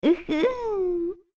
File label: Laughing